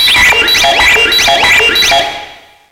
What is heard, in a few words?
CARTOON, Hard & Loud Techno